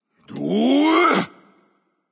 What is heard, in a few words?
battlecry; smite; olorun